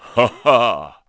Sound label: "Haha"; King of Red Lions; Zelda